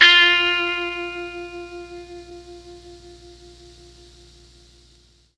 F3 GUITAR, mellotron; MKII

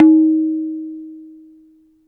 tabla tun; drums; one-shots

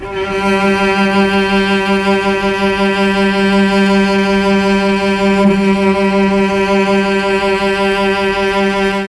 G2 CELLO, mellotron; CHMBLN CELLO

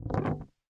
noise muted strum, swag bass

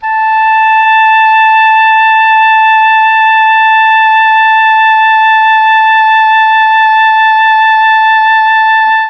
A3 OBOE, mellotron; CHMBLN OBOE

